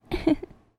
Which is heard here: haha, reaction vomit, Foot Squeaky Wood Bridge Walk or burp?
haha